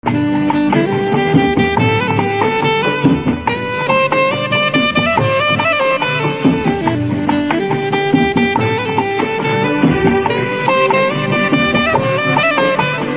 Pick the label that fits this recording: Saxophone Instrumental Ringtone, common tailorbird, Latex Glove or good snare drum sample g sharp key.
Saxophone Instrumental Ringtone